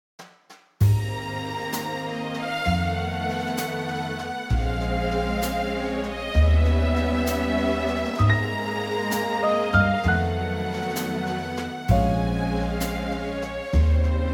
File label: Ab orchestral